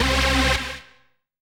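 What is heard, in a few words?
Rave Synth, Dance Mega Jungle Rave; 90 s Sample CDs; Best Service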